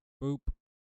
boop

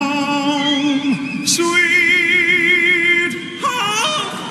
voice crack

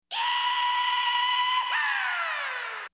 ihaaaaaaaaaaaaaaa

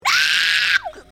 screech

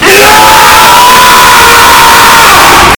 scream meme

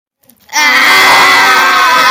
screaming; while looking at ur mom

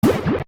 disappearing soul

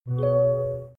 Mazda seatbelt chime

